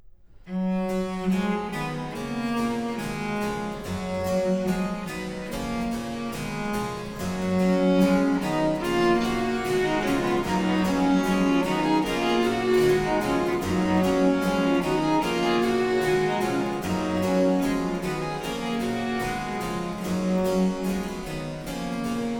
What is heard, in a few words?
Canon triplex, harpsichord solo; Bach; Musikalisches Opfer BWV 1079